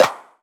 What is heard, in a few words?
CLAP